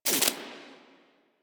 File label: Screen Glitch